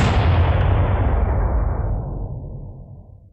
cannon; Minecraft; Votive Content

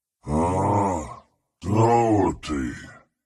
zombie villager say; minecraft; Conquest Default

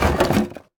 wooden pressure plate; Minecraft; Conquest Default

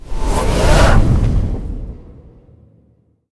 prepare mirror, illusion illager; mob; Minecraft; Conquest Default

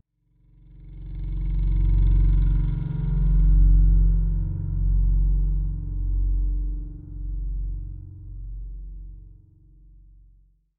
cave, ambient; Minecraft; Conquest Default

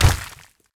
land, pointed dripstone; Minecraft; Conquest Default

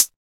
hat, note; Minecraft; Votive World

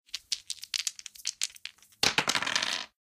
rolling dice; Minecraft; Votive Content

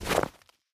snow, step; Minecraft; Conquest Default

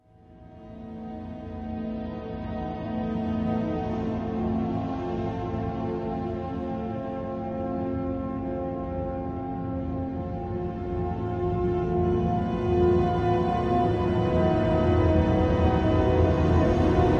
menu; minecraft; Conquest Default

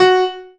pling, note; Minecraft; Conquest Default

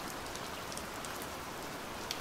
rain, ambient weather; Minecraft; Votive World

